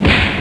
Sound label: fang slap; ENOMERCY; noyz